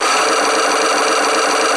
lock chains; trilogy

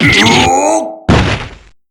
yeeted

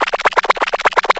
sweating

